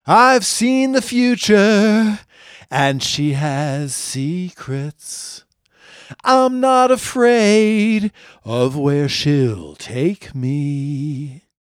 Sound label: male singer